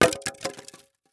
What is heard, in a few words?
Impact Styrofoam Hits Concrete